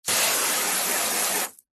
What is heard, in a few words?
garden hose spray water jet plastic bin; household